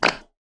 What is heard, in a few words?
excretion; horror